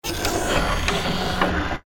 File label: electric door